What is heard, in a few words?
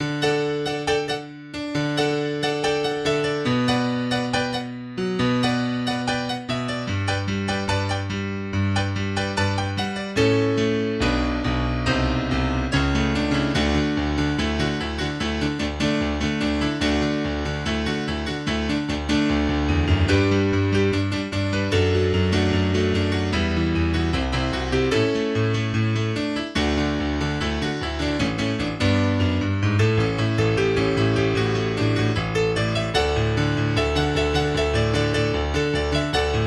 Piano